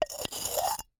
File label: Jar, terracotta, ceramic, lid, rubbing, scraping, grating, rough, ragged, swoop, lid tap; Eiravaein Works; Vaeyan IV